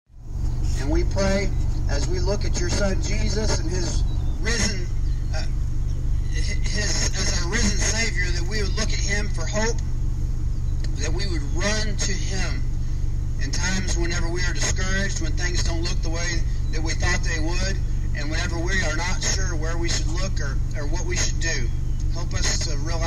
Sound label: Cars Honking Amen, First Baptist Church